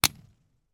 leisure toy car drag racer release from starter unit carpet; dinky toys